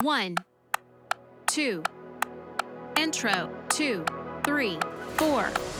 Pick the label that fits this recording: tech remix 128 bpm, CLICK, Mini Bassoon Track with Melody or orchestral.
CLICK